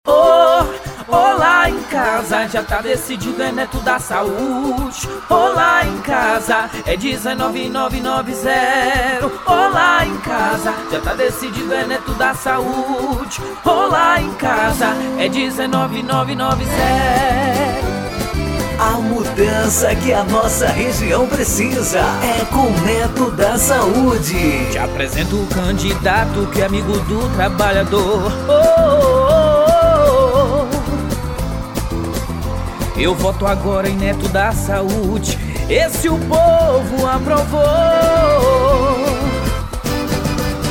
jingle oh lá em casa sertanejo universitario para vereador; eleicoes

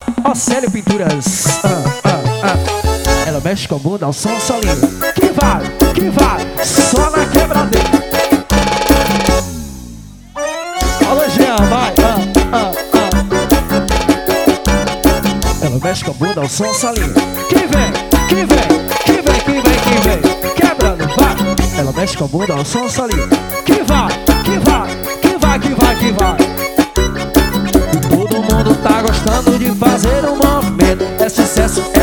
forró solinho

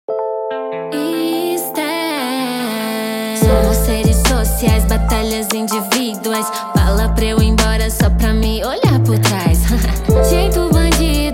rap trap

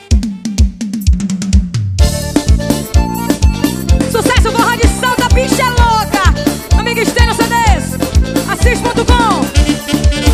Forró

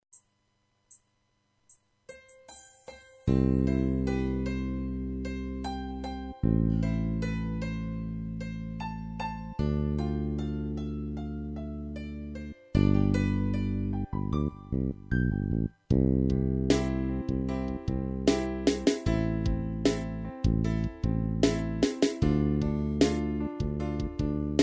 forro samples, samples para teclado roland

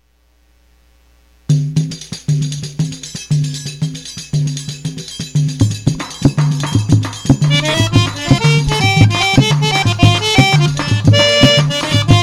forro manhoso; 8 baixos

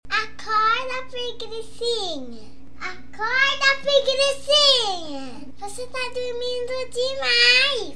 toque para celular

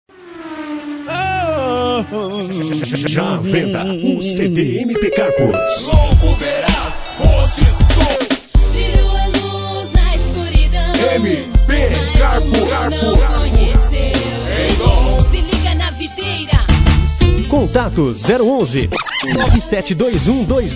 vinheta

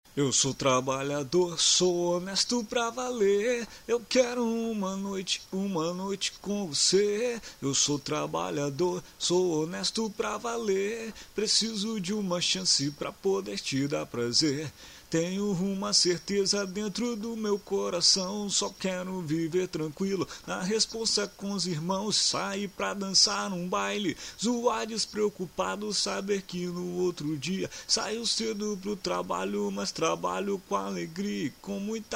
Funk

